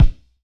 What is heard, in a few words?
Kick Natural; Jomox Kicks; Mystic; Airwindows; Dimensional Jomox Kicks